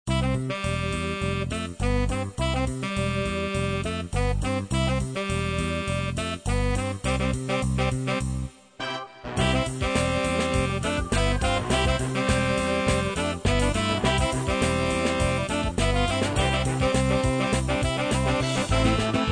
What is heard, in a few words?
SALSA JAZZ